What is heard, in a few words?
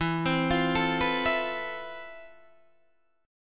F Major Sharp 11th E